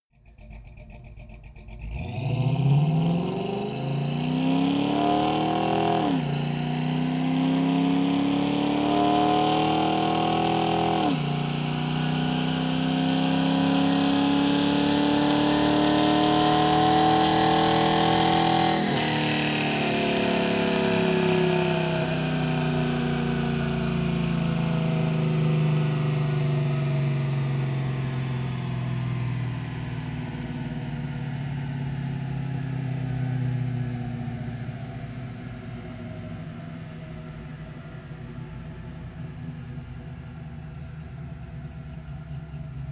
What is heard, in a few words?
car camaro accelerate decelerate shift exhaust